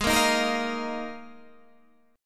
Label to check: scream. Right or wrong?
Wrong